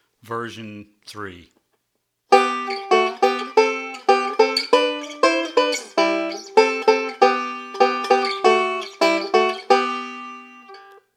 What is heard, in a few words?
Using Thirds And Sixths